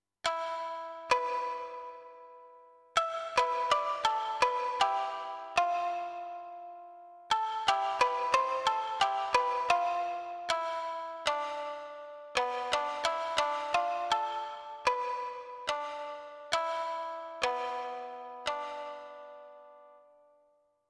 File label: Shamisen